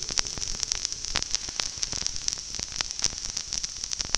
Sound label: Atmos